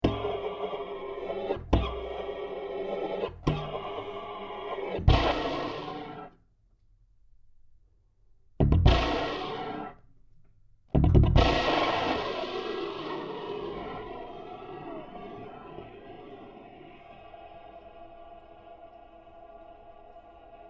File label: Dial